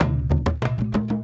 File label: Dung Diga, Drums; Sonic Foundry (Sony Creative Software); Friendly Fire; Marc Steve